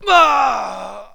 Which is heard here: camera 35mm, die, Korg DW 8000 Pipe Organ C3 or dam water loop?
die